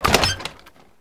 Bucket Pedal

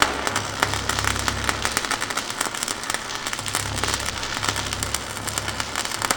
Machine Spark Loop

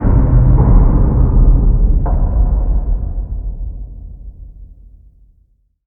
Random Subterranean

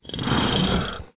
Book Present Shelve

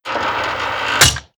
Spring Action Arm On

